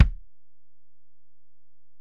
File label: Ac BD, Alesis DM-PRO; 300 Drum Machines